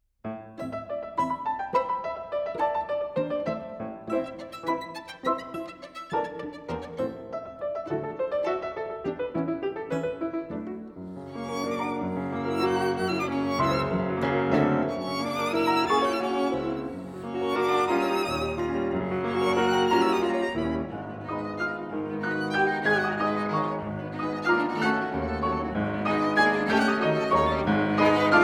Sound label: Piano Trio